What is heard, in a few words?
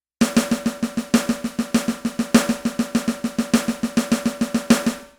paradiddle; drumming